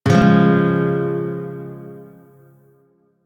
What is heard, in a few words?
Shawzin Phrygian Chord F, Music; Warframe